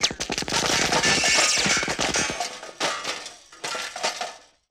Machine Gun Rico Multiple; Sony Pictures Sound Effects Series; Ricochets - Hits - Whiz Bys

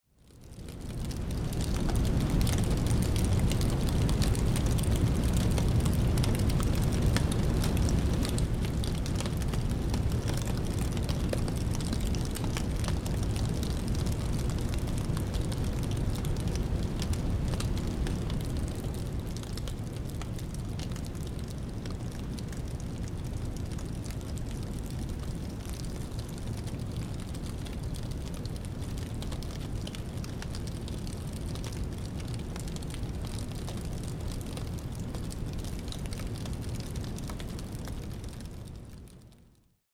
Fire; Age of Empires III; cinematics

